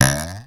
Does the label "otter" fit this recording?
No